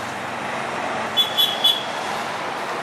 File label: Metrobus